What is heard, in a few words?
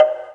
log drum; Greytsounds; EXOTIC PERC